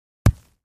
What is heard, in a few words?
Ground Hit Sledge Ham; rocks & dirt